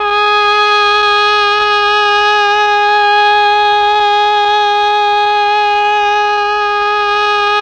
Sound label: MEL OBOE